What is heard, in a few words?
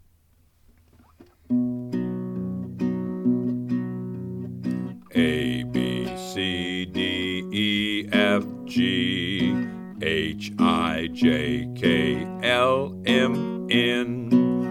Guitar